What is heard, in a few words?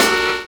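SAX N GUITAR; Univers Sons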